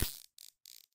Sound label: ziptie start; civ13; civ14; Items; Handcuffs